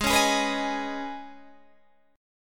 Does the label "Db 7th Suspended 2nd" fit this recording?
Yes